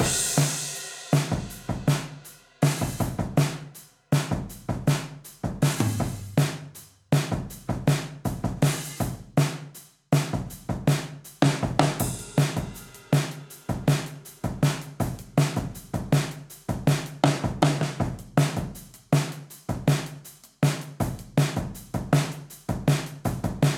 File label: poprock Drum Room Intro Outro; hot lava; ios macos windows; Molten